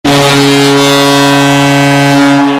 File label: goal horn ringtone; nhl